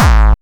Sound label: Bassdrums Hard Kicks; Vengeance Samples